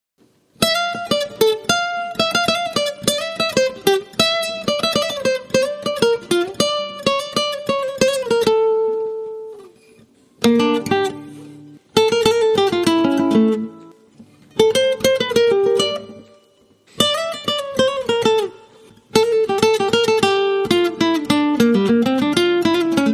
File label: Guitar Only